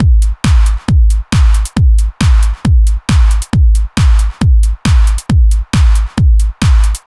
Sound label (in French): cordes